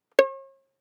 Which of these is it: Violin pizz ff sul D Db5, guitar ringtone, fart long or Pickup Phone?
Violin pizz ff sul D Db5